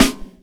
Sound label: Snare Chrome Mono, drums